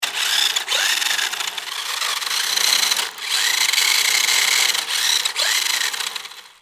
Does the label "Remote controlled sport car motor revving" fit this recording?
Yes